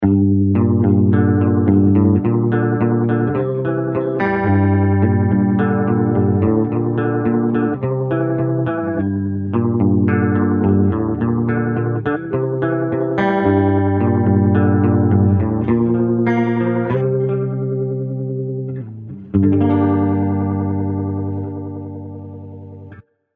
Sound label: Heavy Chorus